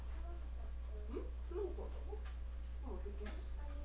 harp

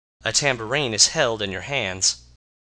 tambourine

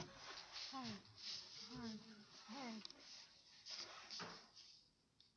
harp

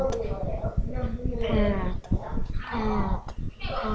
pad

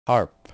harp

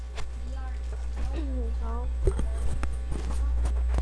knock

